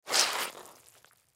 Wet Towel Squish, Wet Towel Squish Step On Ring Out; Squishes; Foley; Objects; Blastwave